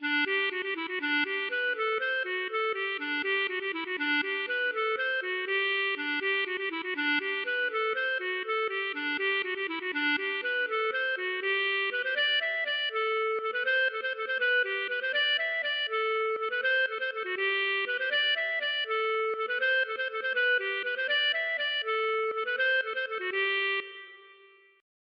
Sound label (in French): bourree; Droite